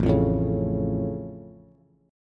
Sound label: A Augmented A, Bass